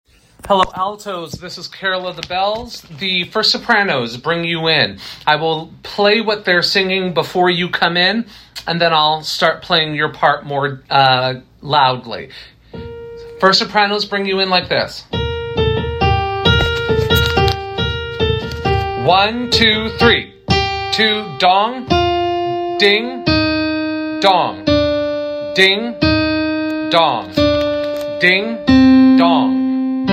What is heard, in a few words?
Alto